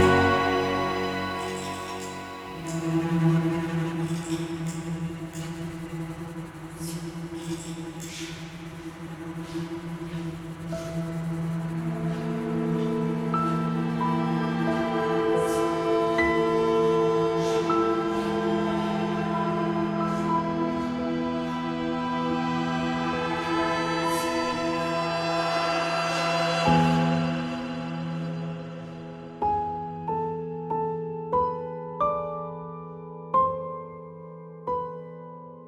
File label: Creepy Forest 90 BPM Loop